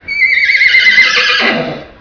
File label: Horse Neigh